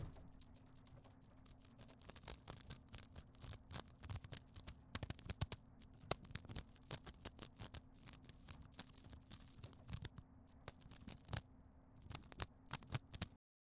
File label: Camera Eating Film